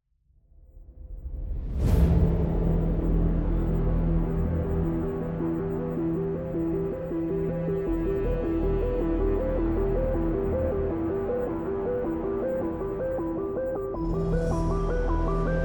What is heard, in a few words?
Epic Orchestral Cover